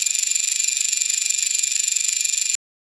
rod reel fishing loop

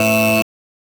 short buzzer thud low mi; fast decay

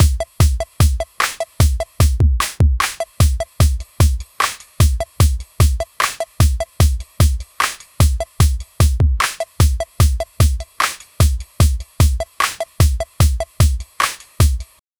a punchy and crisp 75, electronic

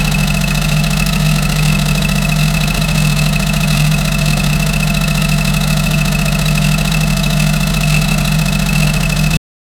a seamless loop of a, race car

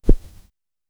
soft touch

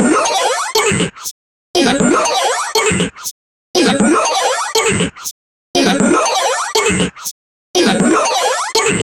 kaboom

